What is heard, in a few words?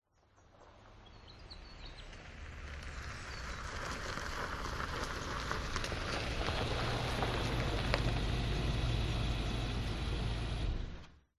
vehicles car suv approach slow stop off gravel grit birds nissan patrol; zapsplat